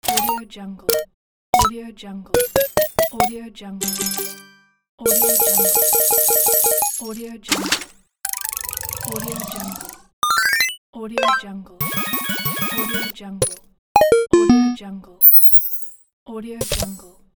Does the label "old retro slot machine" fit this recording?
Yes